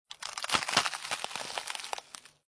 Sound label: ice mine sound; Arc of Templar